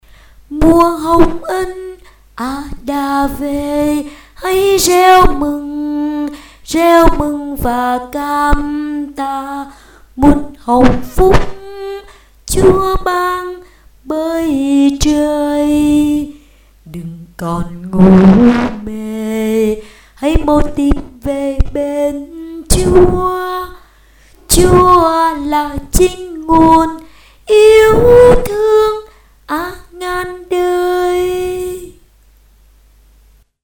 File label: tenor